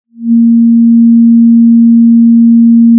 Sound of a cruise ship horn

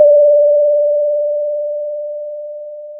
pop, Sound of a school bell

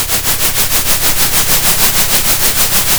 pop, Sound of a train

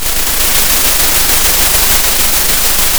pop, Sound of a thunderstorm

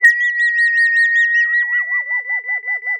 Sound of a bird tweeting nicely

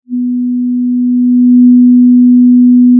pop, Sound of a cruise ship horn

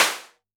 Snr, drum

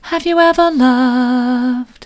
vocal, soft

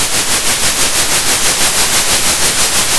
center, Sound of a train

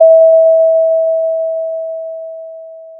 Sound of a doorbell

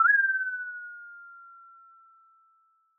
Sound of an owl

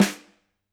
Snr, drum

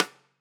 Rim

